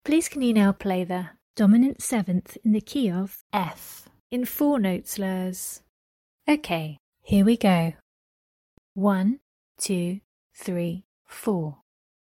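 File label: dominant 7th key of F 4 note slurs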